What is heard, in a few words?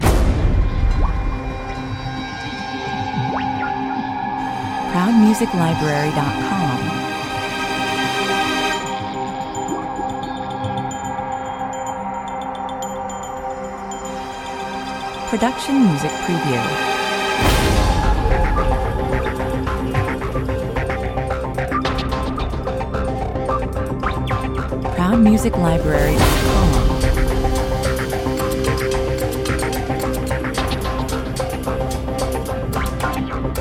energy and power concepts opener (without brass) 110 bpm